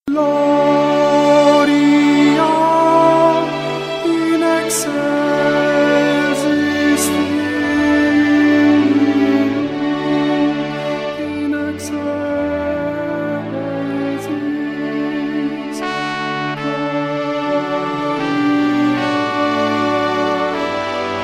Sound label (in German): Motette Alt